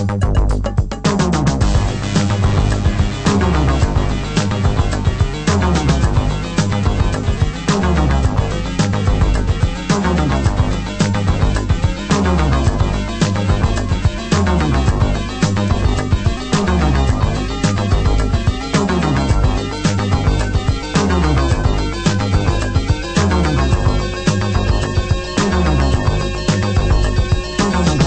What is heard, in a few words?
slap